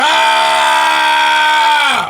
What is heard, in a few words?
aaaaa; serious sam